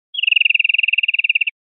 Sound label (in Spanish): chorro absorbido, verderon